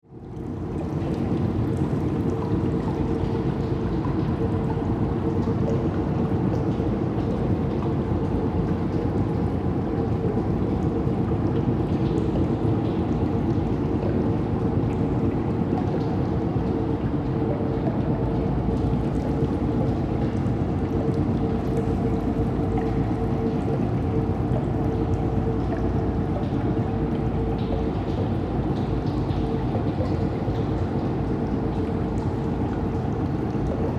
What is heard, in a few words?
Horror Abandoned Factory Water Drips Ambience; Scary Soundscapes